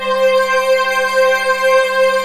JUNO DX7; Rubber Chicken Software Sample Bank

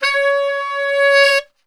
C#3 sax

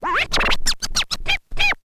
scratch kit, vinyl; Masterbits; Vinyl Attack

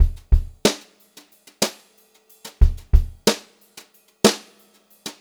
BEAT, Brushed Drum Loops

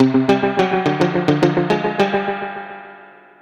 synth arp; Arpeggios; Electrocution

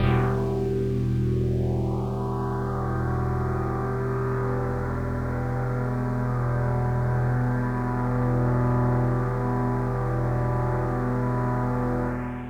One Shot Drone E, dystopian drone; One-Shot Drones

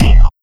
Sickly Hit, Fx; Sonic Foundry (Sony Creative Software); Esoterik Beatz

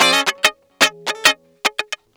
Guitar Loop; Ambient Grooves

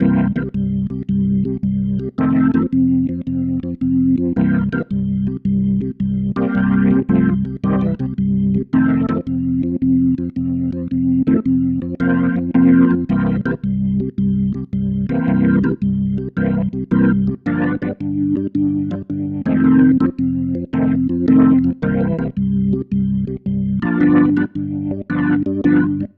Progression, Organ, Loops

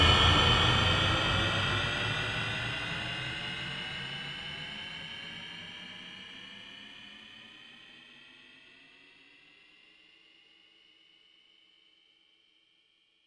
Take Off

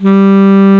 G1 SMO SAX; AKAI S6000 CD-ROM - Volume 1; BrassWind

